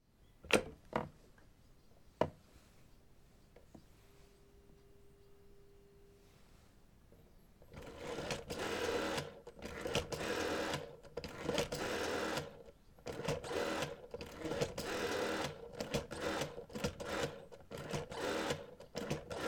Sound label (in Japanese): kurodenwa kakeru; Chino Cultural Complex